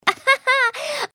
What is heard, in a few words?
laugh; pony; Twilight Sparkle